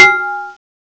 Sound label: SAUCER, BELLS; CMI Sound Library Rev 1.3